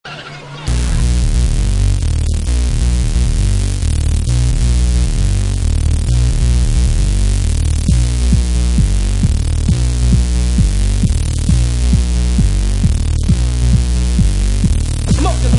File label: fart noise; best sound in dance music